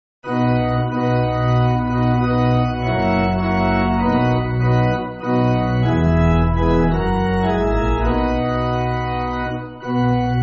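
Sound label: Pipe Organ, church music; O Jesu O Jesus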